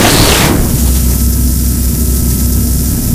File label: zapper loop; Garry's Mod; hl2; citadel